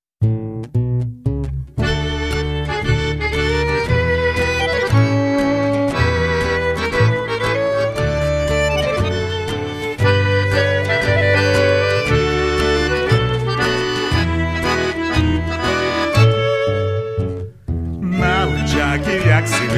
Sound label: Tango